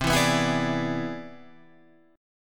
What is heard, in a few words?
C Augmented Major 9th C down Guitar Drop D